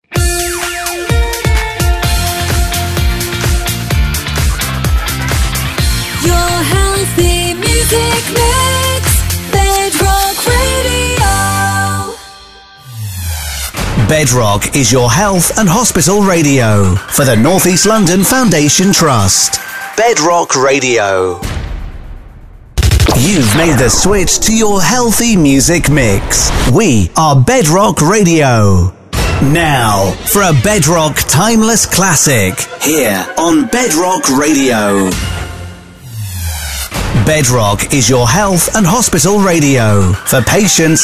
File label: JINGLES; BEDROCK